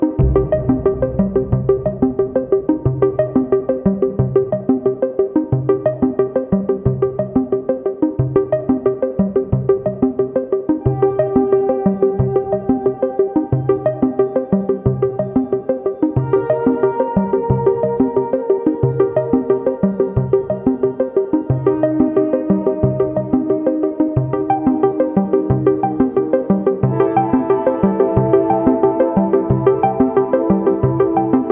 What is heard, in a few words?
Arpeggios